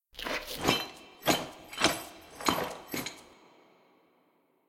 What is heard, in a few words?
walk workout out phase